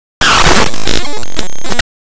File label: glitch